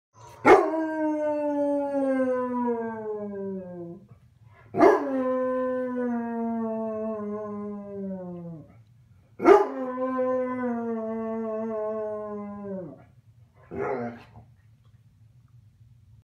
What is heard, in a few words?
Basset Hound; سگ باست هوند